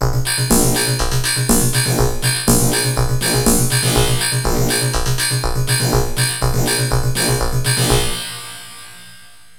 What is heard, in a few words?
VIRTUAL LOOP; Syntec; Wall of Sounds; TECHNO-BOX